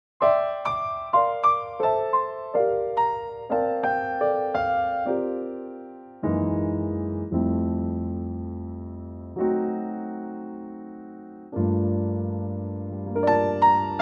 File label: trio; key - F; Gorgeous 2019 Trio arrangement of the classic song from the show and movie "Oliver"